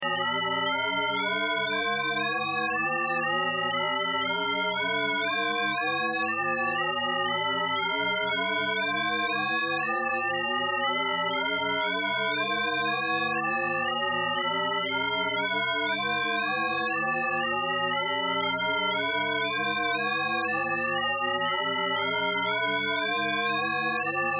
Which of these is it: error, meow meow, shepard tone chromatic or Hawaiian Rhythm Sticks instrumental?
shepard tone chromatic